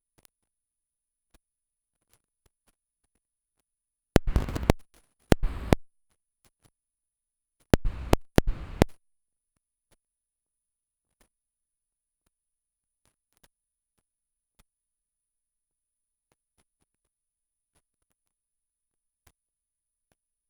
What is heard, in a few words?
Bangkok broken recording; Bangkok-Koh Tao